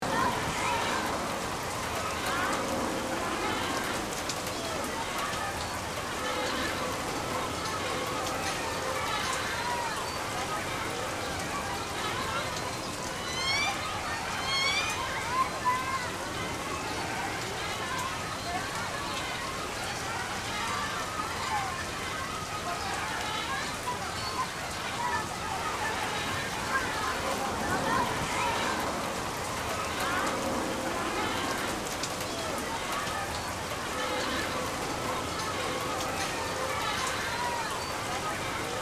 City playground rainy weather children playing loop